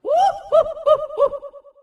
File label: laughing; queen